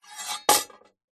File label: warfare sword small set down on hard surface